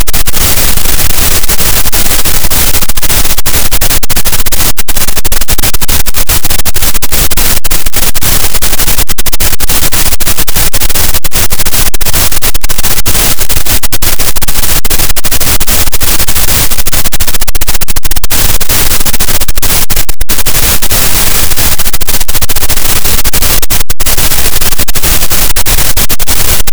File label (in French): cachalot positif, Physeter Macrocephalus, gaussian noise